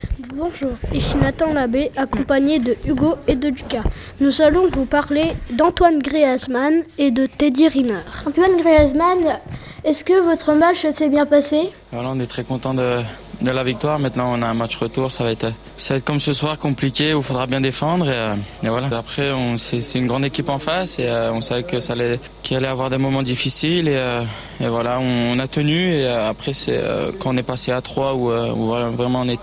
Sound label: sports pad; tap